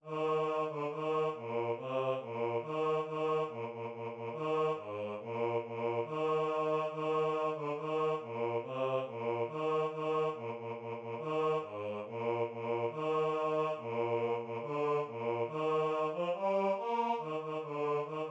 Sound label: Baritone; Deck the Halls